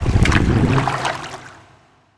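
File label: maia drink, dinosaur; maiasaur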